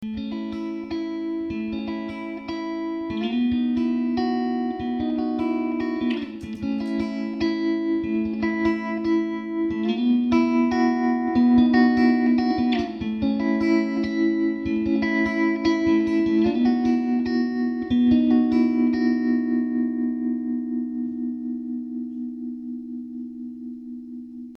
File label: shimmer; effectrode